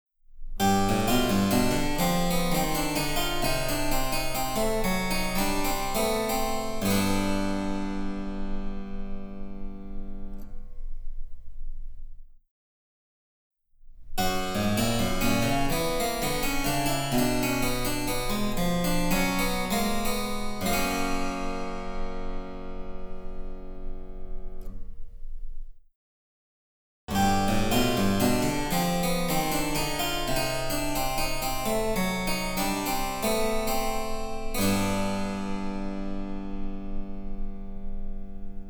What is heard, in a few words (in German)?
Cembalo; Mark Lindley WTC 1